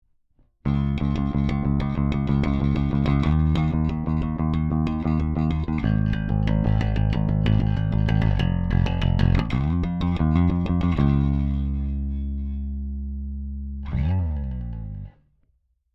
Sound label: Bass dry